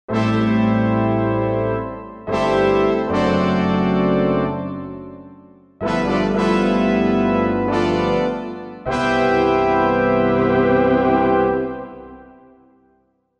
Soft Bras Pd, Korg MSC-1S; Korg MSC-1S Variety

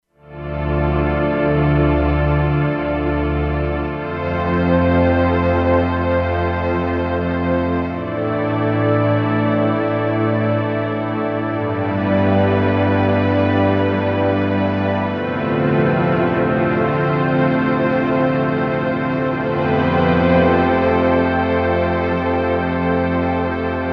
Resting Pad; Factory Presets; E-mu Proteus-2

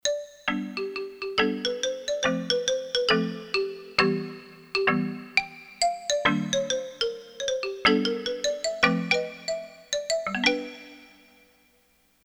MARIMBA, Korg DSS-1

